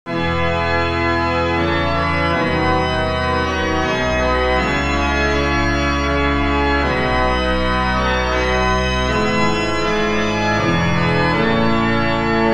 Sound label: Solemn March in F, organ; PL Laeken Mini